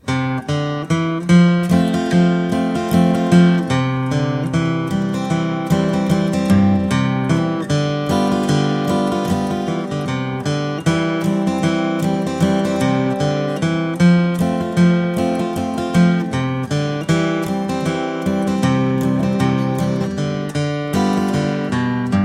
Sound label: folk guitar